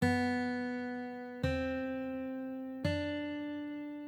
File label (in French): corde; marionnettes; Repiquage audio